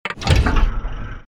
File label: door lock